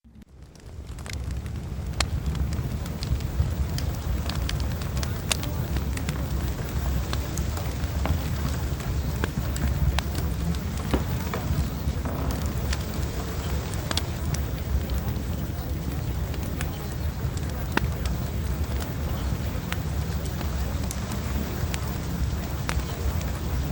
Fireplace; Premium Subliminals

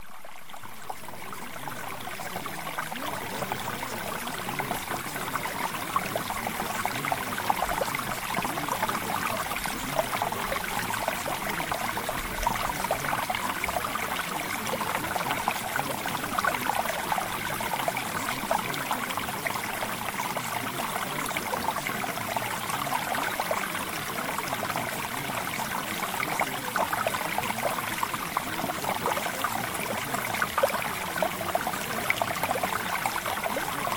Mountain Stream Sample

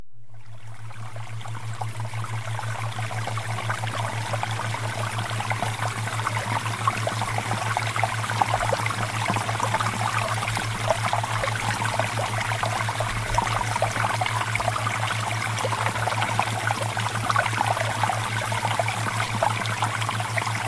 Water Theta Waves; OVERCOME PROCRASTINATION; Premium Subliminals

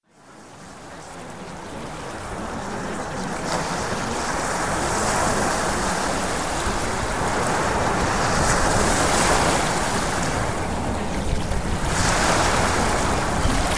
Ocean Waves; Premium Subliminals

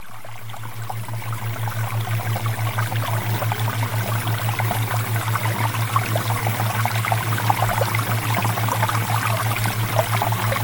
Water Theta Waves; Premium Subliminals

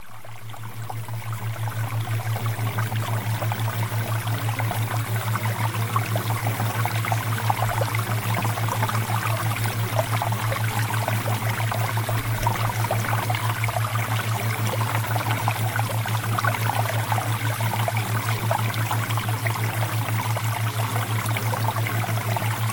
Water Theta Waves; OVERCOME ADDICTION; Premium Subliminals

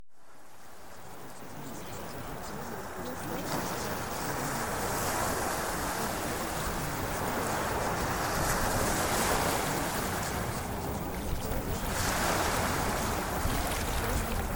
Ocean Waves

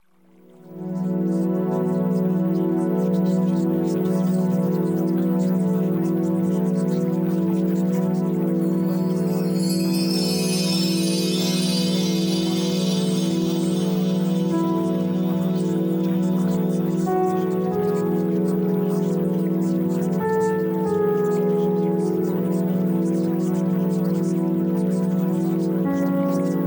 Music

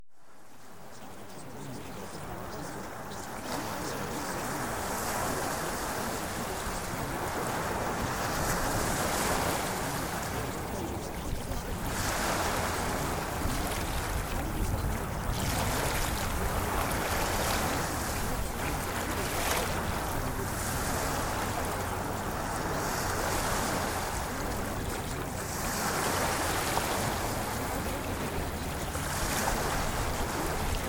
Ocean Waves

